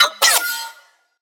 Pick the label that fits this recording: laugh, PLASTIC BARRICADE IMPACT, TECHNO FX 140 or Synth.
Synth